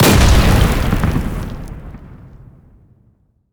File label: Sfx Rubble Impact; Minecraft Story Mode; Colossus